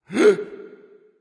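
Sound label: nonverbal breath; smite; voicepack; ullr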